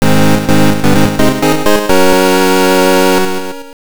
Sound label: Act Start; smurfs the game boy